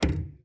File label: Aircrafts Cockpits Stick Pitch Lim; DCS